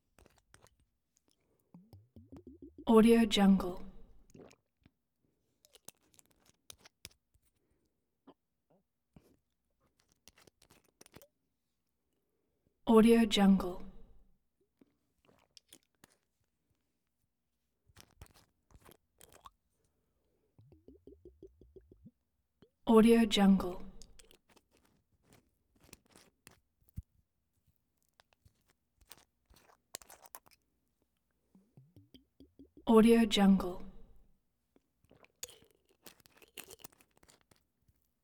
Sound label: nip bottle drink unscrew cap drink short swigs